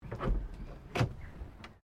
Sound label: CAR HOLDEN FJ FRONT DOOR OPEN, Classic Australian cars; Holden Fj 1955; Vehicles